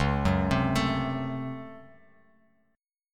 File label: C sharp Major 7th Suspended 4th Sharp 5th, Bass Standard; C#M7sus4#5 arpeggiated; JGuitar